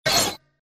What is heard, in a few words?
Melodic decrease; WeMod